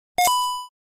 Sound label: Game coin pick up